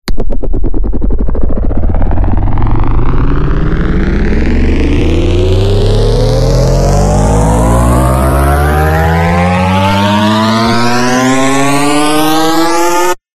RISER; PMSFX

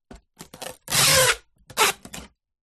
office sticky tape gun apply tape to parcel

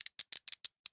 Handcuffs Close; Blastwave FX

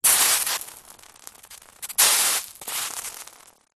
horror acid burn; zapsplat

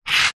stone small scrape on concrete paving slab; zapsplat; foley

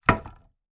impact wood planks x2 drop on floor together

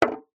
instrument drum small gamelan hit stick single

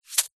wet sand handful throw down onto wet sand; foley; zapsplat

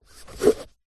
household book slide out bookshelf; zapsplat

